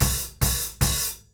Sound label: KICK HAT, Live Snares; PlatinumPhattCD1; E-MU Producer Series Vol. 8 – Platinum Phatt